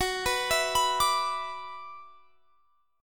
F sharp 7th Suspended 4th Sharp 5th, guitar; F#7sus4#5 arpeggiated

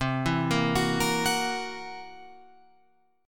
C 7th Flat 5th C down Guitar Open F; C7b5 arpeggiated; JGuitar

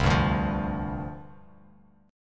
C Suspended 2nd Suspended 4th C Bass Standard; strummed; 8,10,0,10; JGuitar

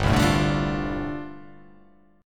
G 7th Suspended 2nd Suspended 4th

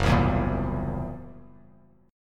Gb 7th Flat 5th Gb down; strummed; JGuitar

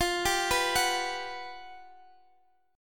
G Major 7th, F; Listen to GM7/F arpeggiated; GM7/F Chord

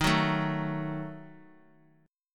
Eb Diminished; Ebdim strummed; x,3,2,0; JGuitar

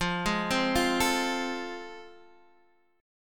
A Minor Major 7th Sharp 5th, F

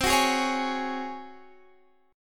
Db Major 7th Suspended 4th C

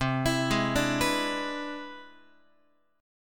C Augmented Major 9th, Guitar; arpeggiated; JGuitar

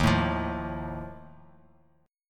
E flat Suspended 2nd Flat 5th, Bass Standard; strummed; chord; x,6,7,10; JGuitar

